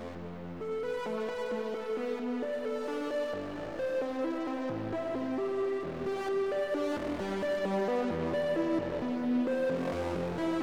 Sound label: Brutal Synth